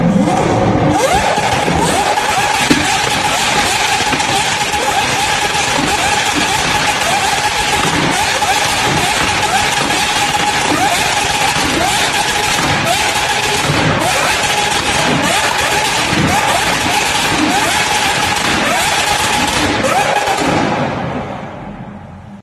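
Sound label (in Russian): громкий рев aventadora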